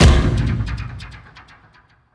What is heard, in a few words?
cable impact, strider; creatures; Half Life Alyx